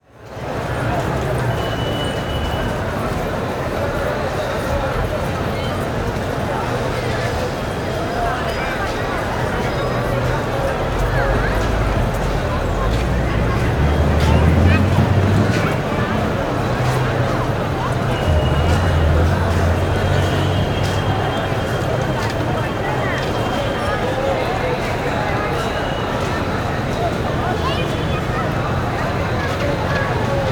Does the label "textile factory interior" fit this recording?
No